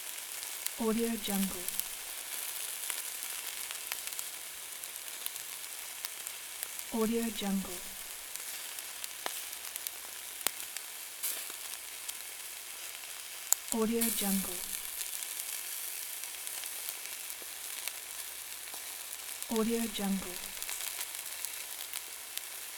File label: meat cooking on outdoor grill